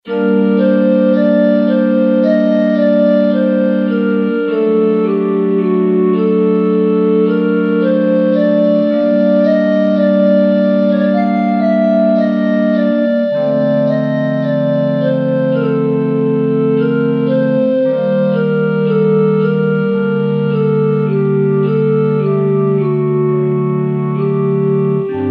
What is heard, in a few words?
Flute Pipes, Kurzweil K2000; KEYBRDS; Kurzweil K2000 Orchestral ROM; Orchestral ROM Supplemental Disk